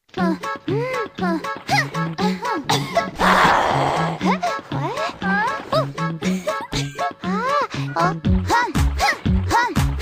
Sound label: Polka; Horo